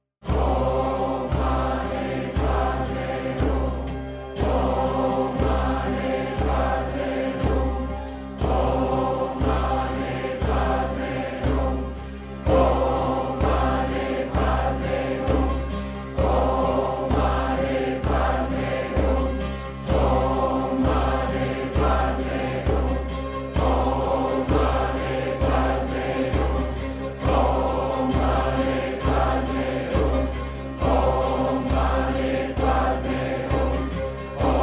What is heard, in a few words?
om mane padme hum